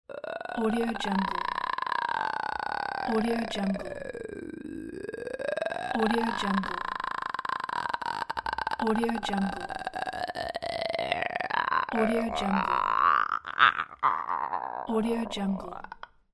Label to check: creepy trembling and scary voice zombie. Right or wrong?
Right